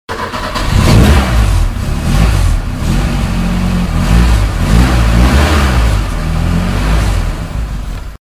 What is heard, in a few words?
Taunus XL, 1975 Ford taunus Coupe